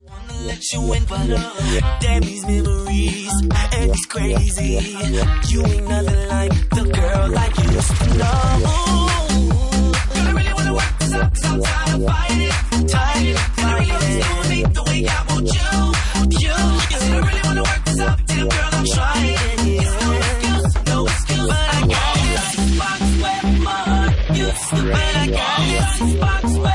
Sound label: Bassline Remix